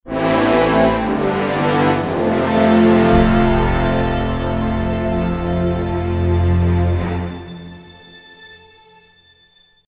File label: Transition; bridgecommander